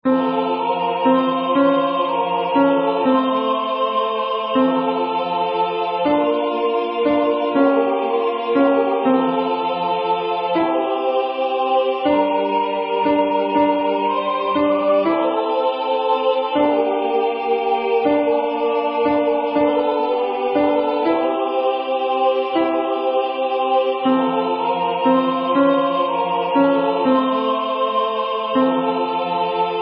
Ave Maris Stella Alto; Ave Maris Stella Garau

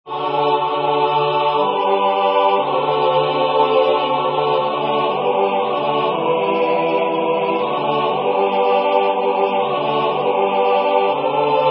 Kievan Chant Tone 1

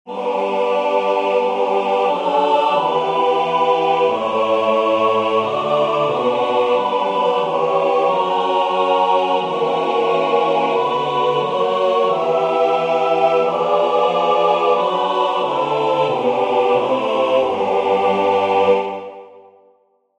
Double Chant in G major

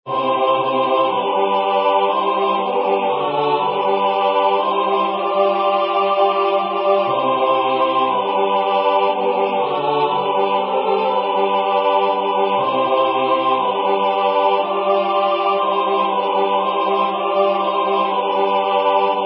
Kievan Chant Tone 7

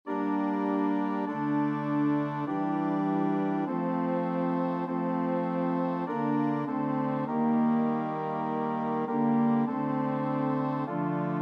O Bone Jesu, TTB Organ; M. GRANCINI

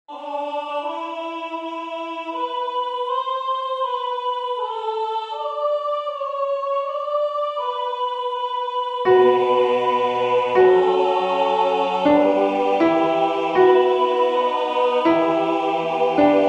Amen Dico Vobis Alto; Issac

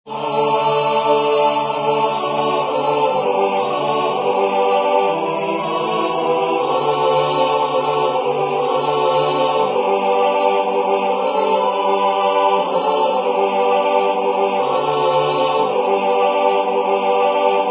Kievan Chant Tone 3

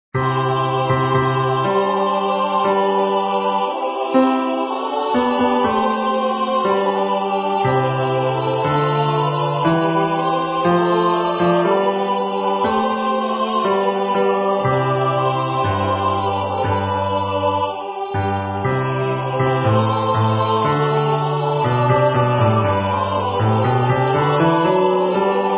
Tollite Portas Bass

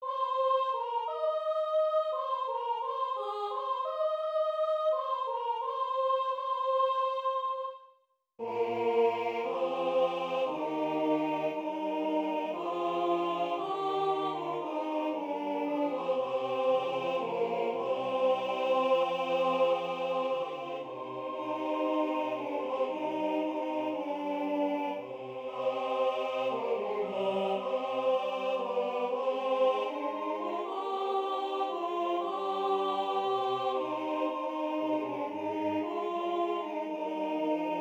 Haec Dies Alto dominant

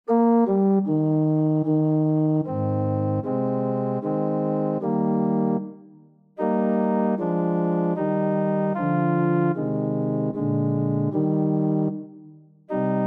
Órgão; O esca viatorum TTB Compact

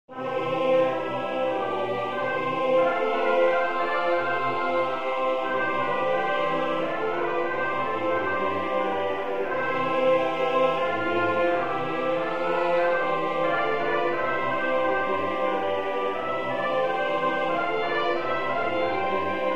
Easter Hymn descant

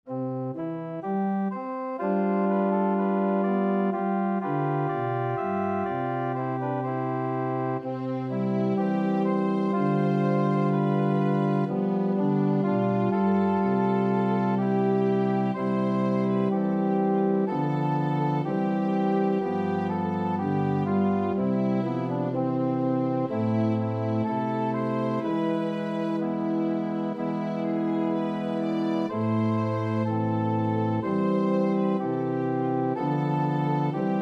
Cheia sois de graça Organ; Lyra Sacra

